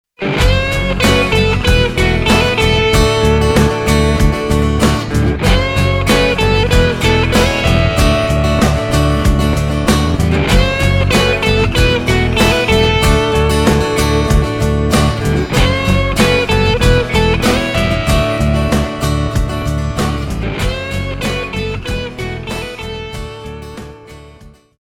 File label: ROCK Stroke A3R, guitars; musical-instruments; el-ac-guitars